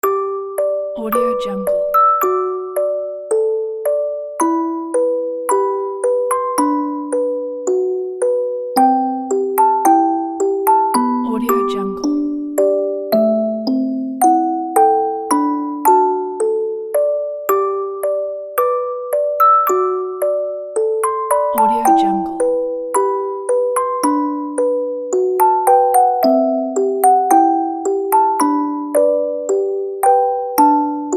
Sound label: music box lullaby